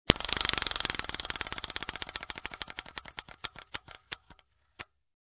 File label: Spin; sfx; Muzyka i dźwięki z gry